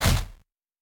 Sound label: Hitting Long; Folk Hero